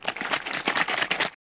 Potion bottle glass shake

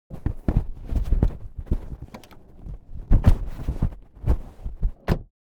Car interior foley searching in compartments

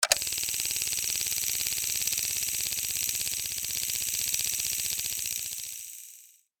Vintage 8mm projector running

